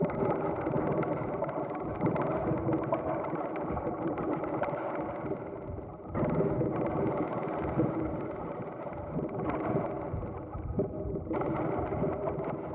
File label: xen grenade protect idle; infestation; Half Life Alyx